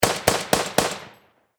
Warfare Machine Gun .303 Caliber Vickers Four Shots Distant Perspective